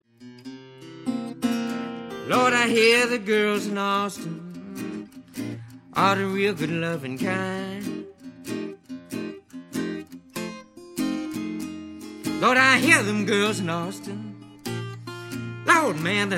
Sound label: Acoustic Track